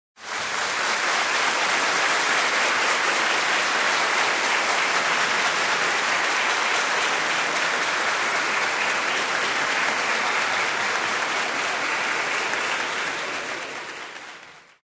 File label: Award Acceptance Applause